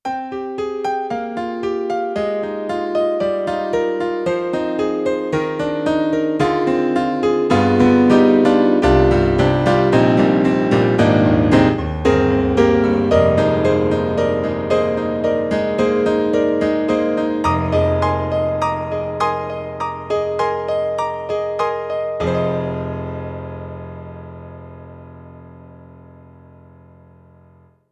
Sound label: YAMAHA MU90 Bright Piano